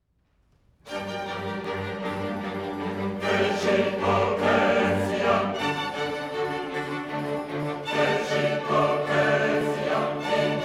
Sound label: chorale; magnificat vivaldi